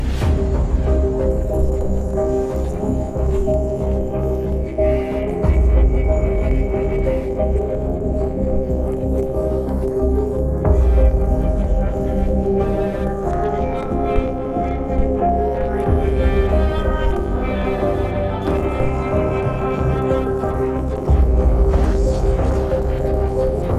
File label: piano trio in e flat